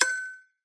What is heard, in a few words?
multimedia game sound hit metallic ring score target